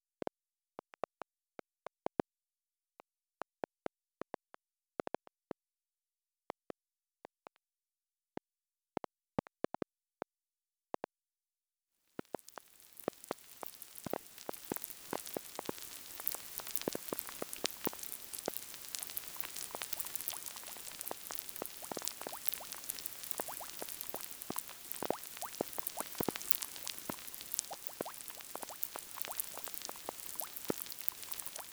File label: rain